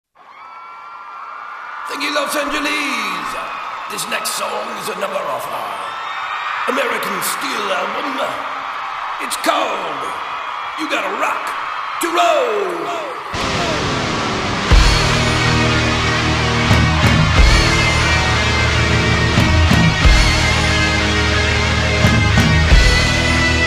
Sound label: Rock to Roll